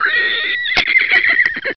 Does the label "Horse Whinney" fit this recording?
Yes